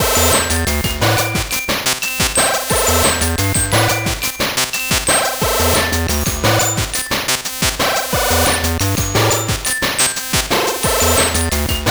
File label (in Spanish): gameboy instrumento